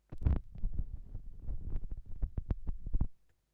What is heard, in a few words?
Turntablism; Sonokinetic - The Roots Drumloops